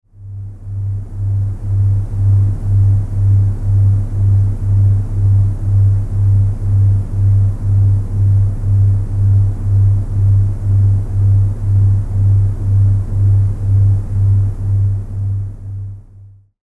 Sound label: Orange Noise Binaural Beats 2Hz